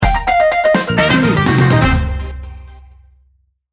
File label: festival of funk sting bumper